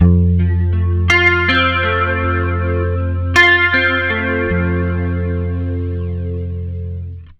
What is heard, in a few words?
80 MAJ ARP F, Acoustic Guitar Loops; USB Soundscan vol.04 - Electric & Acoustic Guitar Loops; AKAI